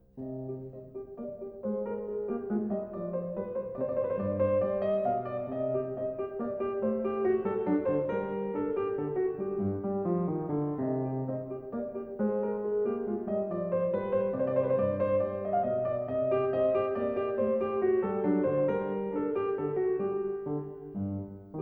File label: Menuet, KLAVIER; French Suite #2 In C Minor; BWV 813; BACH